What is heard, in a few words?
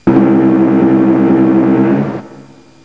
foghorn